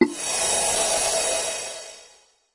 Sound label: click fly in